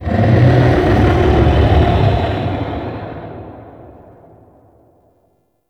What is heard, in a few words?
Maw Burrow Build Up; Warframe; The War Within; Bone Pit; Cinematics